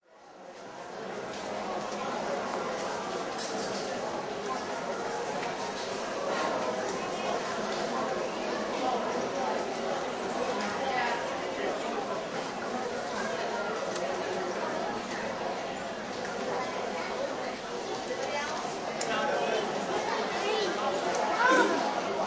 Airport Arrivals Away From Cr, Airport crowd ambience. Arrivals hall - recorded slightly away; Distant talking, people walking past